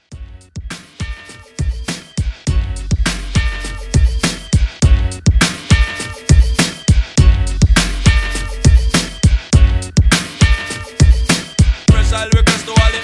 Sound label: Re Drum CLEAN